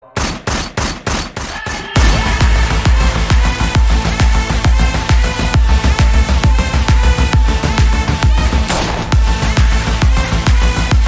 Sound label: Theme Ringtone; Mirzapur